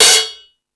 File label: Rock N Roll, Drum Machines